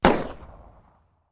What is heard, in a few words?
pistol